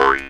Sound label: C# JAW, Primitive Instruments